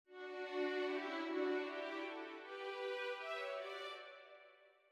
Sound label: ORCH piano; Haydn; Symph93; OutofContext